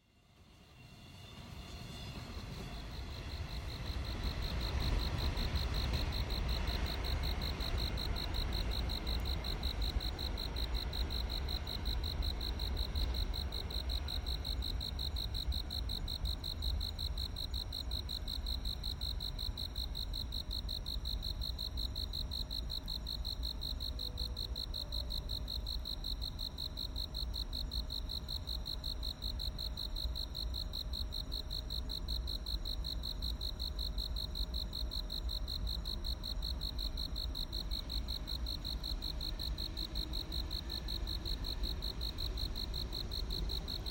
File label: crickets